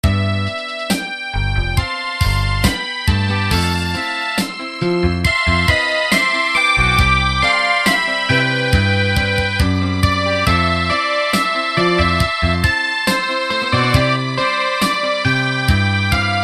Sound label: kiss